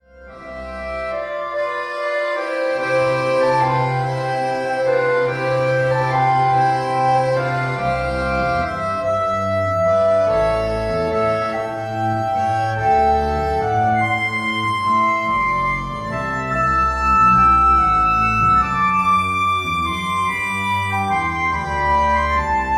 Sinfonie Trio Computer

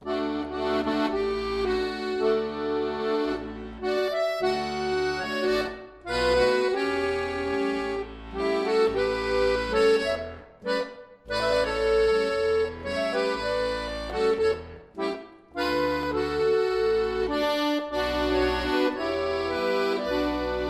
Tango in D, akkordeon

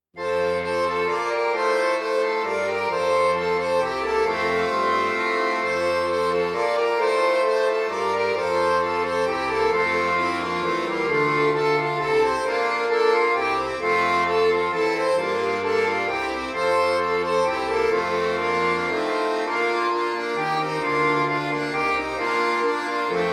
Kommet ihr Hirten Playback, Accordion Duo; Traditionelles deutsches Weihnachtslied, neu arrangiert für Akkordeon-Duo

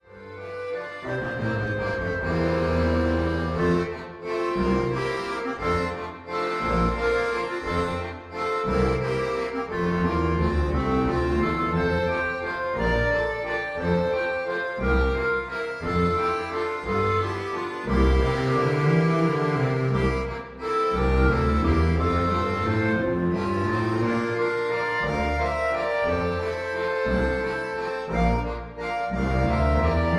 Trio Computer

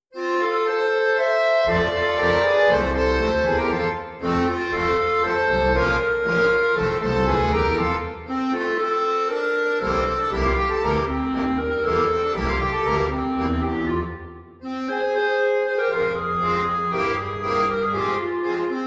Amargura Trio Computer

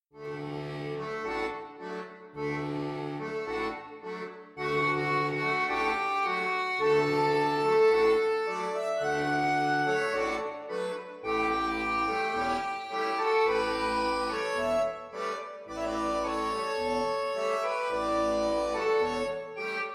Tango in D

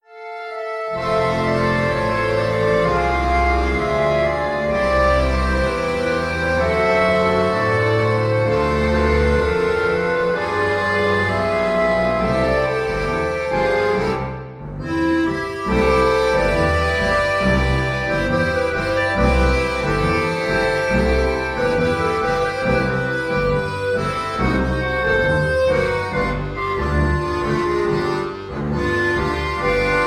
Trio Computer

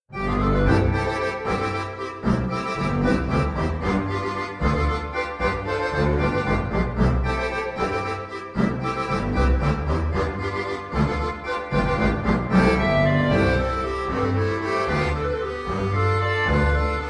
Walzer aus Espana Trio Computer

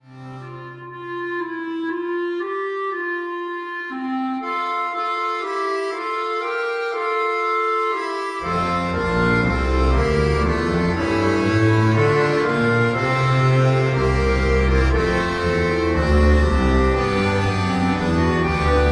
Adeste fideles Trio Computer Sample

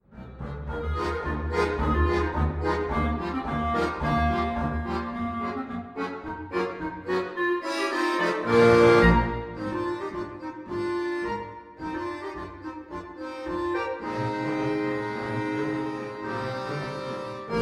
Golliwoggs Cake Walk Trio Computer

